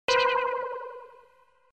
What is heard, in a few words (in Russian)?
pausa anime